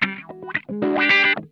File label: RUDE WAH, Funk Guitar; 90 s Sample CDs; Zero G